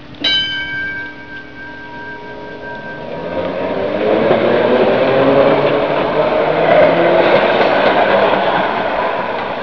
TRAM GONG

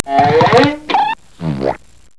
ZAK BONG

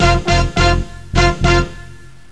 Trumpet Sound

